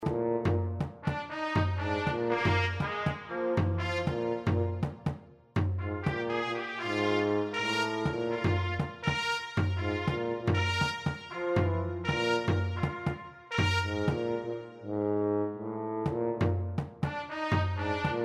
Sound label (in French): trompette tuba